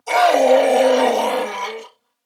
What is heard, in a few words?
Death Corpus Venus Male, en; Dialog; Barks; Battle; Warframe; CorpusVenusMaleB